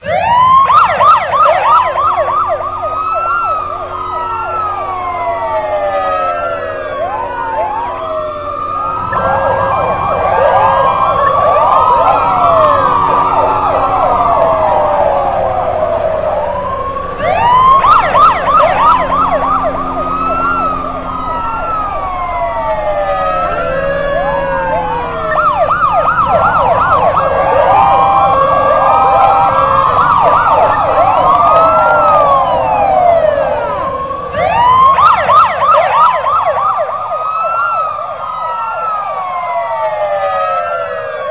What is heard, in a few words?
polices; svencoop; AoMDC